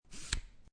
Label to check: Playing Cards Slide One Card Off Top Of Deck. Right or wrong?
Right